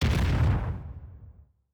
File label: Monster Move